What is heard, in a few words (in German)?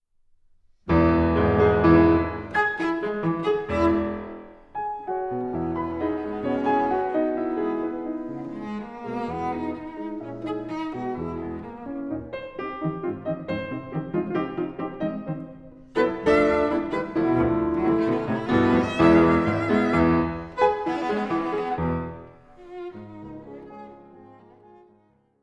Poulenc Sonate 1. Satz Allegro